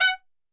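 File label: F#3 trumpet shot, Brass Super Section; Best Service; TRP SHOT; 90 s Sample CDs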